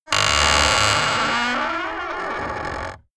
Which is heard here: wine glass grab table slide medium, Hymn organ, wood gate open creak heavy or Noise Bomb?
wood gate open creak heavy